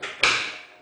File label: pull on